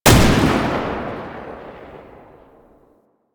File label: Belt Fed Rifle Shot; Weapons; Warframe; Tenno; Frumentarius